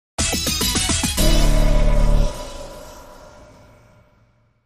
Power Logo

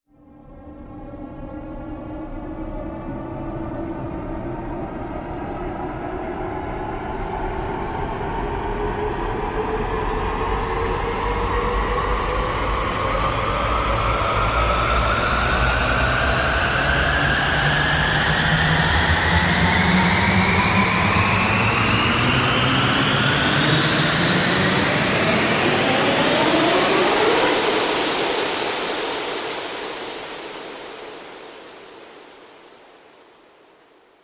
Rise Long

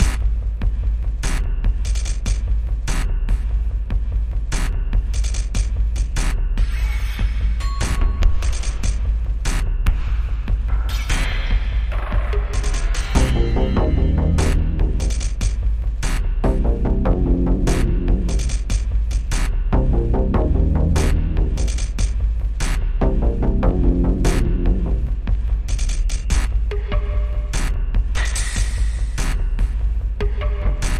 Rock Steady Bass

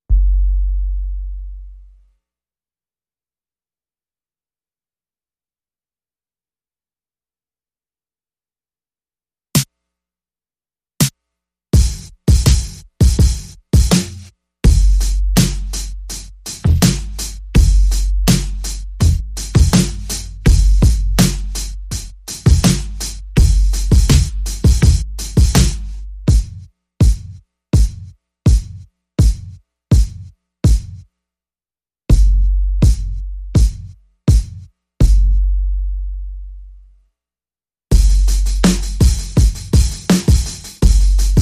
Drums Only

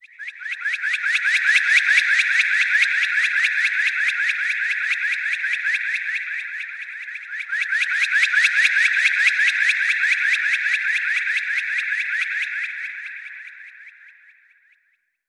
Echoes Whistle Pulse

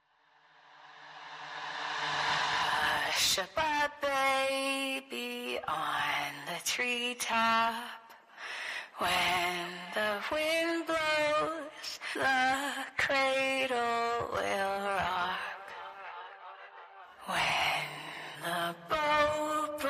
Horror, Vocals Only

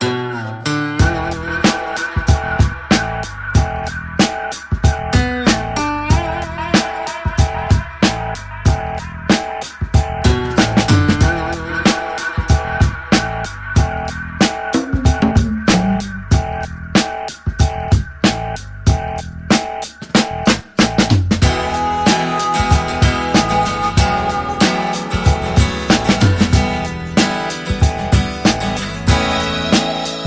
Fire Raising Funk

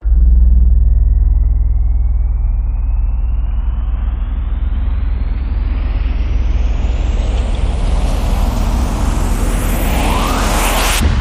Rise Long

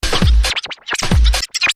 Groovy Squeeze

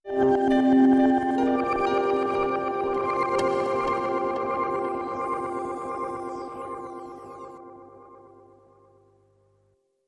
Solfeggio Sting

